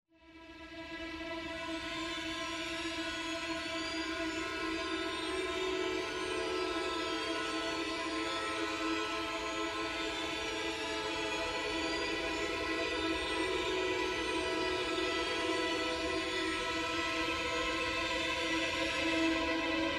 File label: DISTORTED TENSION